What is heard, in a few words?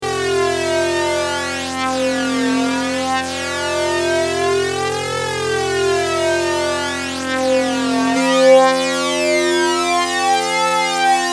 holmes jet flanger